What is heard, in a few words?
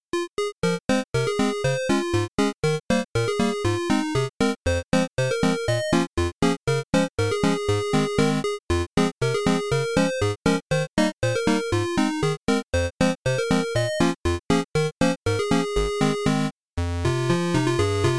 8-bit